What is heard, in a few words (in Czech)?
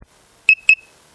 akustik zapnut